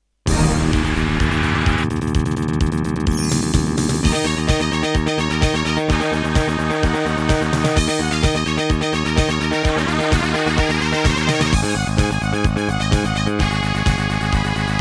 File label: Terratec WaveSystem SCW001-2; Wacky Wheels